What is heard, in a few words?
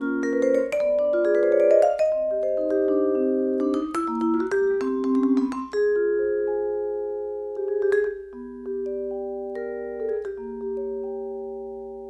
vibes bass, speaker test